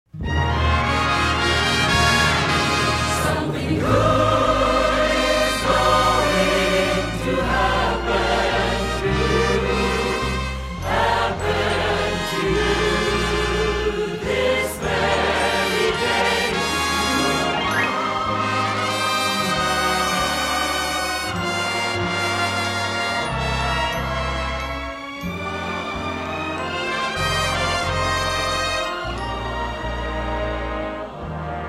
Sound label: Stereo Band&Vocal Mix; Oral Roberts